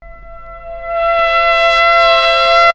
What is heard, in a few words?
BKWD HORN; BEAT2000